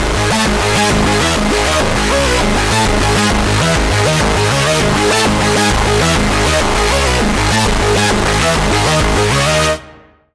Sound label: Lead, Sylenth1